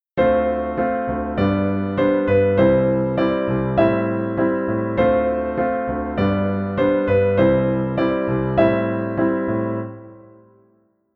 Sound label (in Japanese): 進行の起伏 Dm7 G C Am7, piano